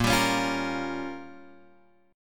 A sharp Minor 6th A sharp down Guitar Drop D, chord